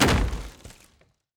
environment object chest breaking

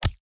mob damage

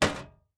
object drop metal

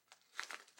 ambience room shadow room working layer

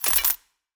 forge weapon buy; Shelter

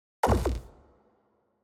ui battle begin low layer

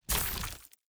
gold block destruction stone

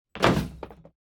zone barricade hit

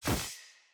witch projectile; Shelter

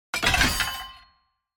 ui player morgenstern break

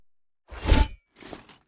npc crusader strike

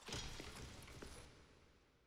ambience room debris; necromastery

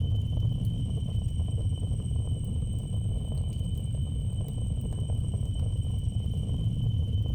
Box Rocket Loop; TANK; 3Souls

